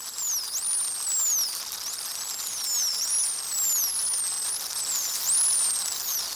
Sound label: striped dolphin